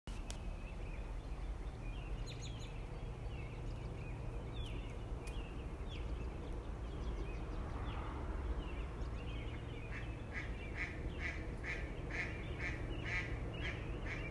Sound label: outside sounds